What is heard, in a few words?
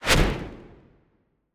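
Impaler Fire Swoosh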